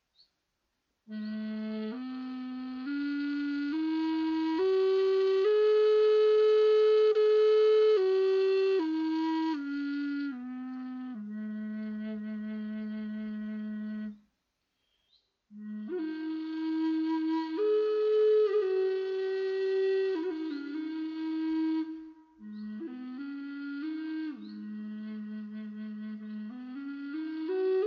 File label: handmade western cedar native american flutes; This is one of my favorite woods to make Native American Flutes out of; Bass low A